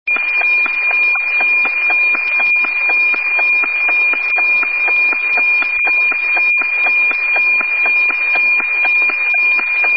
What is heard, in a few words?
mode wefax noaa14 vis 240lpm, Radio